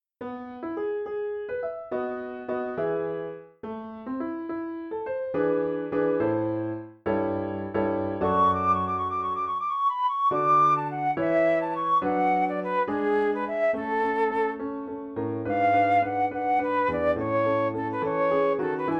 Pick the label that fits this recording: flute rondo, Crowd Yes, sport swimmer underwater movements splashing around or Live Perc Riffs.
flute rondo